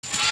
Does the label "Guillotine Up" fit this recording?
Yes